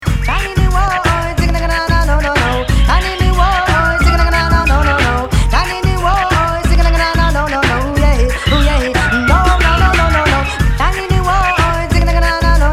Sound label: Transition; Detonate and Sasan's Free Crack Giveaway